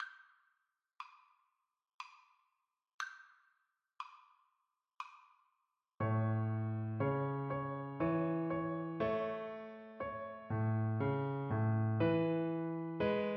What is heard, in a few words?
Minuet, Cello